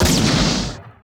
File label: GSHOT, LA GUNSHO; Total Control - Action Cutz